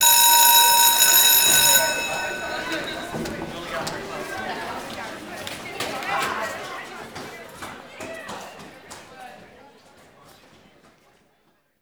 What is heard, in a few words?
Destroyed Class Room Bell; Warframe; The New War; Zariman